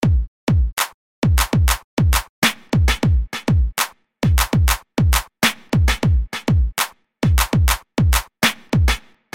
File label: beat n echo